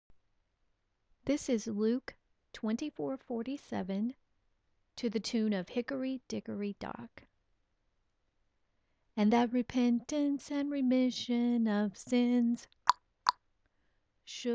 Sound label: song recording